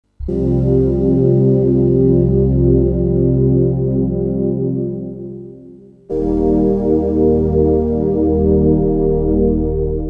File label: stereo pad